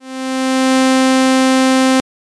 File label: attack; Psychoakustische Grundlagen der Musik